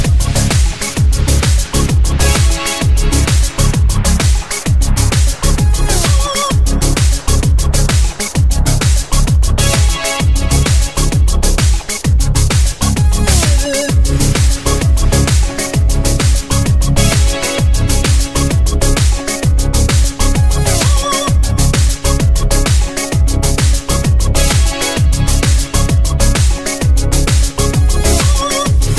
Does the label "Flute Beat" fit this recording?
Yes